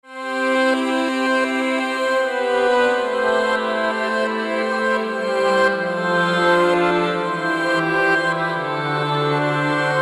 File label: Voices Dist Vox Enhancer; Arturia Augmented Voices; TUBE CULTURE